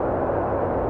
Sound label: fist; chambersOfDoom